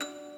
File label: musicbox